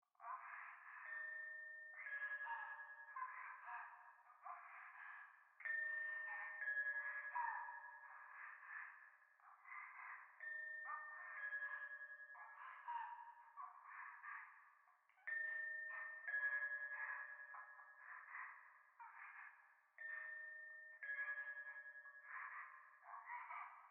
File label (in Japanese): 教会 右耳密着処女喘ぎ 神社